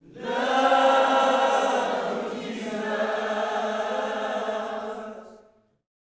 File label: LOVE JESUS, Vocal Gospel